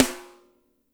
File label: SNR; Spectrasonics; Burning Grooves